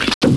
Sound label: Amoeba Hit; AmoebArena™